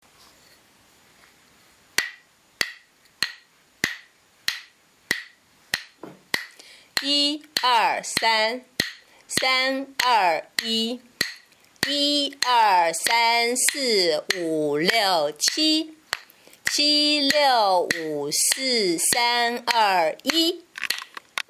rap pour compter

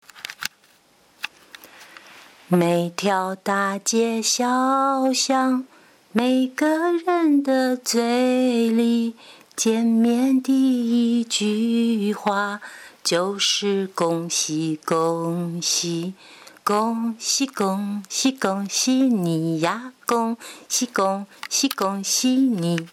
chant Felicitations

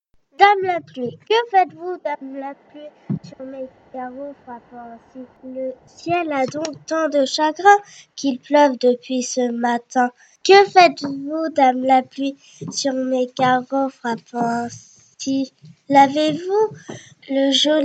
dame la pluie

